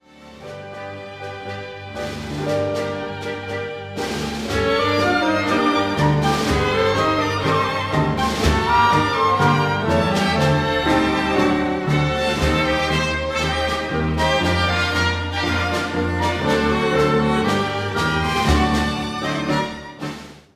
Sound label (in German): Tango